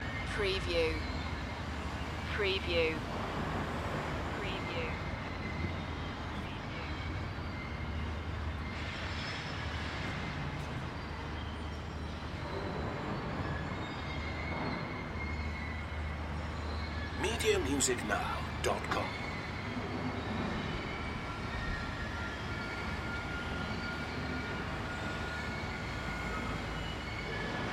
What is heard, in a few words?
Construction Squeaks Thunder